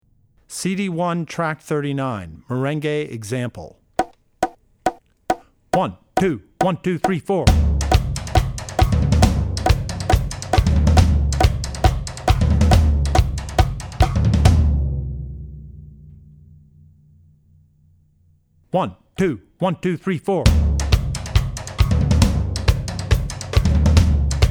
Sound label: Merengue; Drummer's Bible Tracks